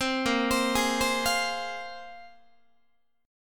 Bb 7th Suspended 2nd Bb, Guitar 4A 4E 5A 5D 5G sharp 6C sharp; Bb7sus2; arpeggiated; 15,18,15,18,16,16; JGuitar